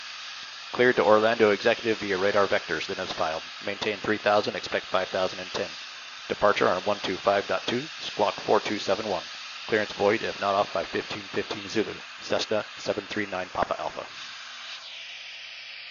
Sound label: Cessna 172 Bose A30; Zulu 4; sound comparison